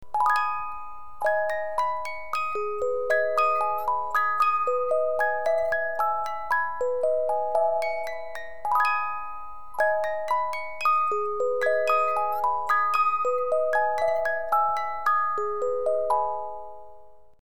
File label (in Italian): carillon